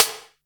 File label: Boss DR-660 Snare, Drum Machines